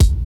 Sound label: RAVE KICK; Drums In Your FACE!